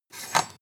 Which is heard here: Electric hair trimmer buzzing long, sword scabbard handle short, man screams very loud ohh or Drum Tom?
sword scabbard handle short